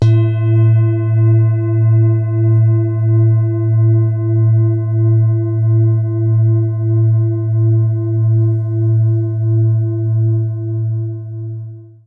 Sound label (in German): Klangschale Venus tief; Liefeld